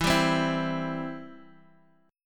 C Major, E, chord

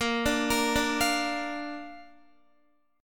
Bb Major Bb down Guitar; arpeggiated

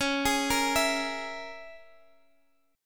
C sharp Minor 6th, Guitar Open G

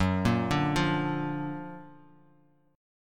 Gb Augmented Major 7th Gb down Bass Standard; Gb+M7 arpeggiated

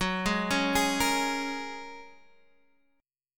G sharp 7th Suspended 2nd Suspended 4th

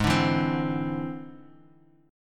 E Augmented 7th G sharp

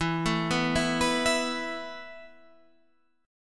E Suspended 4th E, down

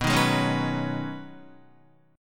B 6th Add 9th B Guitar, chord; strummed

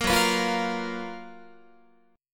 Gb Major 11th Guitar; GbM11 strummed

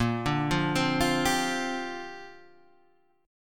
A sharp 6th Add 9th, Guitar Standard; A#6add9 arpeggiated; JGuitar

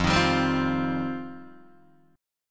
F 7th Suspended 2nd F Guitar Open D

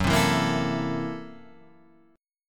F sharp 7th Sharp 9th Flat 5th F sharp; strummed; JGuitar

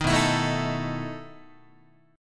B 11th Guitar; strummed; JGuitar

